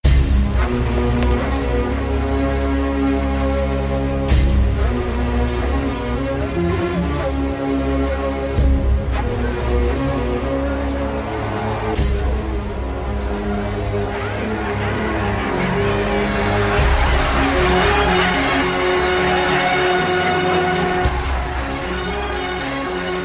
Strange Village Bgm Ringtone

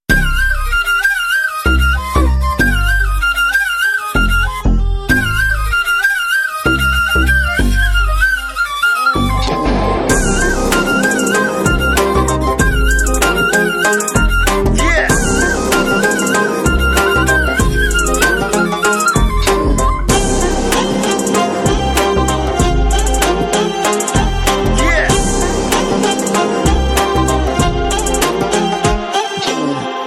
BGM Flute Ringtone

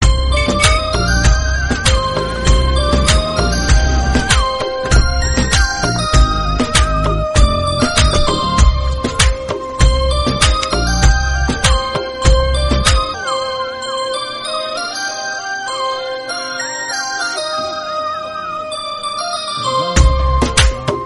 Love Bgm Tamil Ringtone; Vallavan

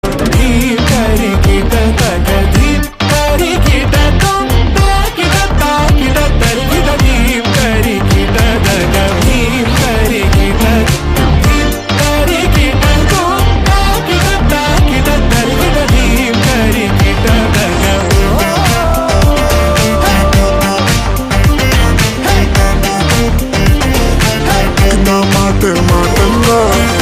Humming; Sharwanand; Manamey

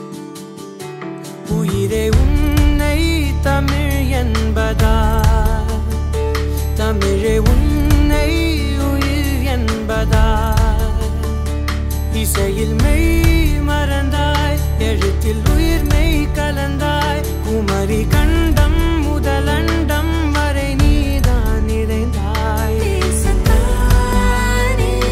Anthem Ringtone; Thamizh

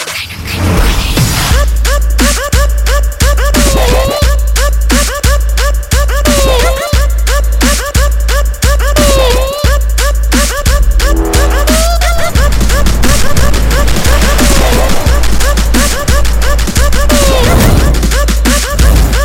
Interval BGM; Kannum Kannum Kollaiyadithaal; Tamil

